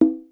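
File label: Conga High Open, Yamaha DD-35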